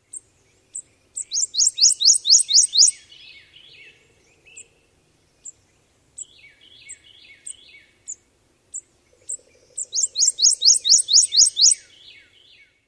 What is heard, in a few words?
songs with calls